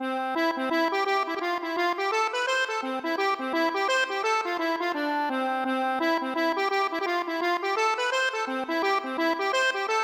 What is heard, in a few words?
accordion